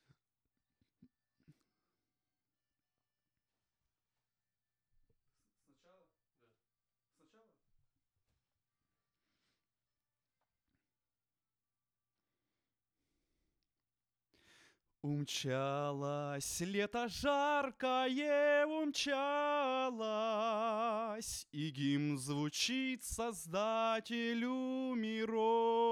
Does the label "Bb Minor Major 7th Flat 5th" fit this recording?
No